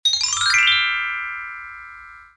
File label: pop up